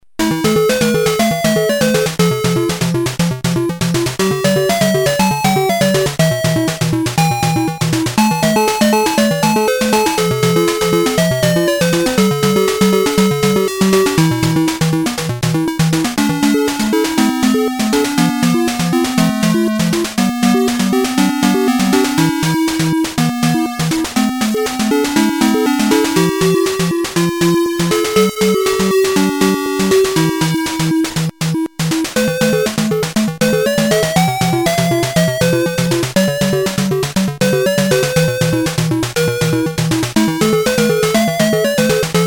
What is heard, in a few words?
Corridor Music